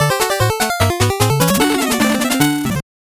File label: 8bit jingle fast